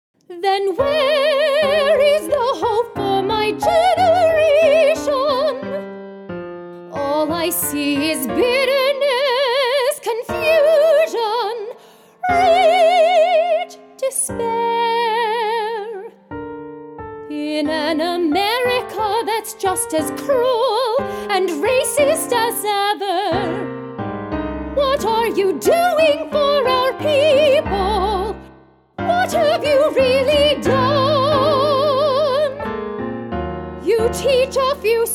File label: soprano aria